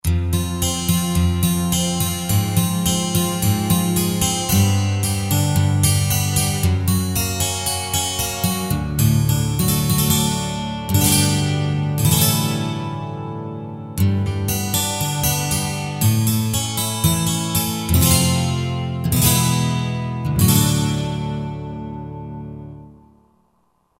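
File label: 12 string Guitar; Roland JV-2080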